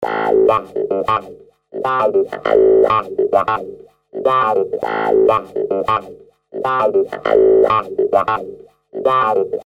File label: Cop Show Clav; Apple GarageBand 2; Apple Loops